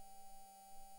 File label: mic, mars2020 edlcam